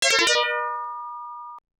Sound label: Coin (Credit); Zero Wing; Toaplan; gamerip arcade